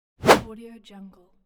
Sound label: whoosh; dynamic sound